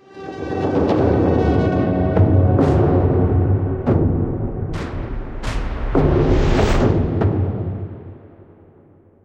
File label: tense fanfare; WrestleQuest